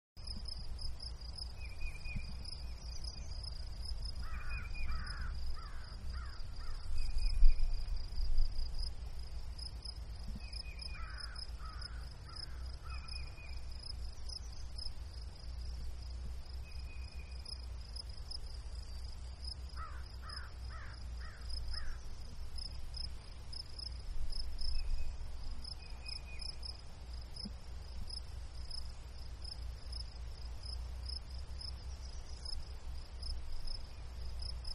Meadow-Crickets, Birds, Crow, Etc.; Outdoors; Backgrounds; Manhattan Production Music

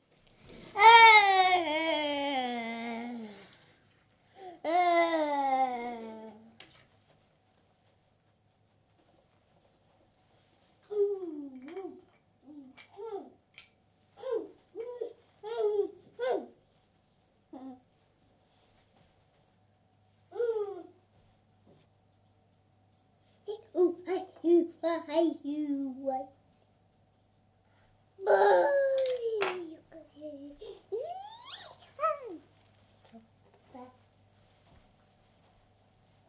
26 month old girl, playing; Baby; Voice; BBC

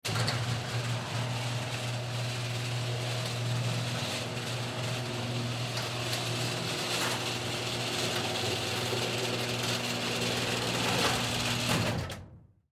Garage Door Close; Electric Motor Automatic; Household; Garage Door Sound Effects; Blastwave

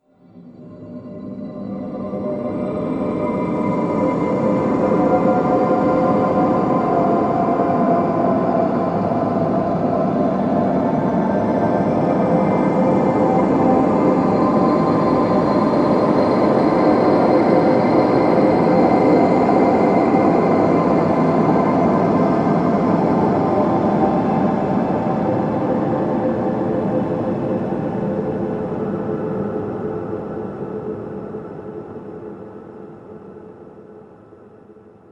Film Atmosphere Alien Planet; Transition Sound Effects

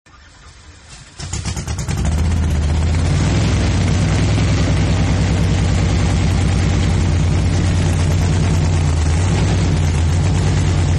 Hot Rod Start and Idle, car; Vehicles; Partners In Rhyme

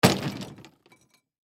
Golf Bag Drop On Cement; Drops (Sound Effects:Objects); Foley; Blastwave

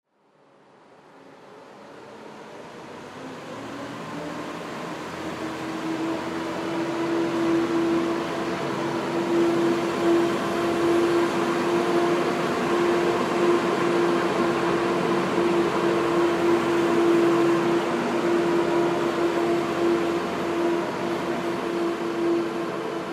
Zamboni Pass By, Zamboni Pass By Right To Left; Miscellaneous Sports; SoundEffects-Blastwave

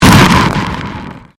Convolution Pulse

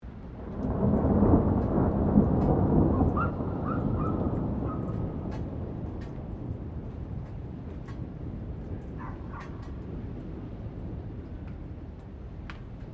THUNDER DISTANT DOG BARKING; Elemental weather effects

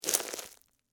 Crunch Ice Cream Cone, Soft, Debris; Blast Wave

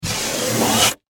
Industry Dry Wall Slide Off Stack; Factory; Factories; Backgrounds

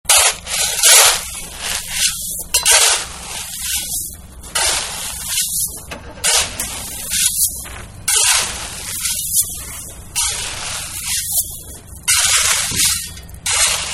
Gravel Being Shoveled; Yard Tools (Sound Effects:Tools); Manhattan Production Music

